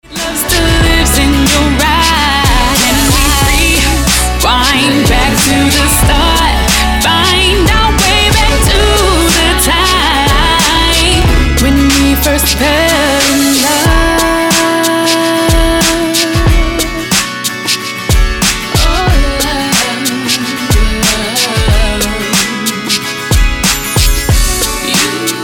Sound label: Singing, Original Song